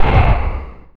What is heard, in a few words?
mimic, death; minecraft terraria